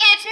vocal

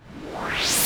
Reverse FX

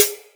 Drum; osu

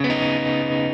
C#m9 Arpeggio down

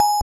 BELL; osu

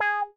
G#5 meow

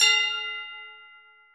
bell F7; osu

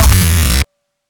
taiko soft hit finish

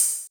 hihat; osu

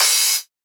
cymbal

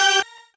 normal hit normal

